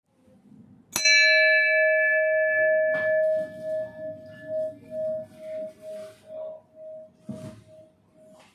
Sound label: bell